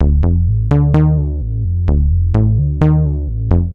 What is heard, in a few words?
Bass (D) 128 bpm; Music Loops; Ableton; Singularities